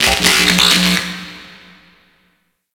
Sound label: Infested Light Flicker; Warframe; Corpus Infested; Ambience